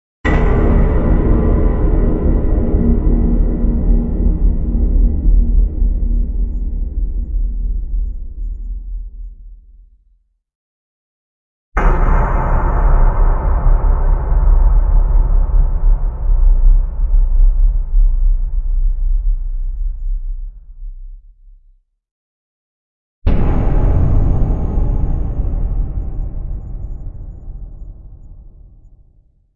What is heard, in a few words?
Boomers (Metal); Epic Tools vol. 1